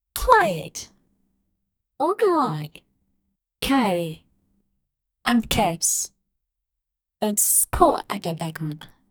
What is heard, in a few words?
platform game female